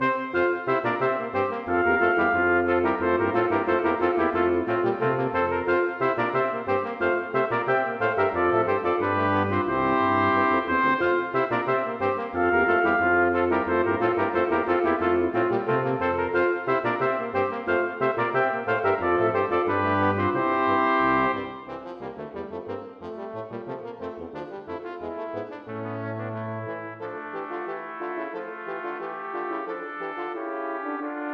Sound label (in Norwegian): Marsch for messingkvintett; Aamodt